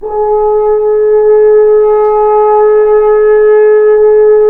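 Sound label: brass, F.Horn Solo pp; Roland L-CD702; F.Horn 3 pp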